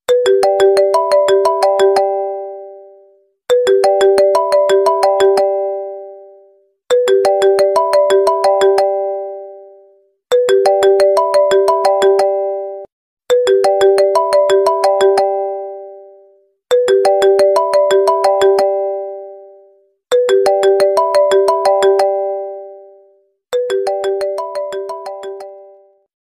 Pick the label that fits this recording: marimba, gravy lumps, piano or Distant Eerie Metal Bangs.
marimba